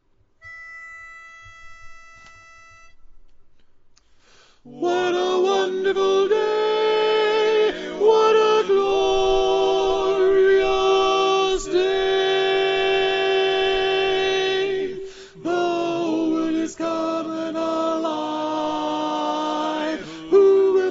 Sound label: Tenor